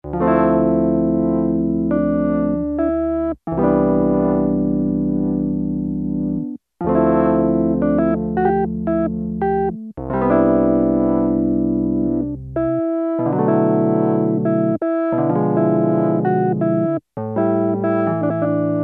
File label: Electric Piano I, Roland JX-3P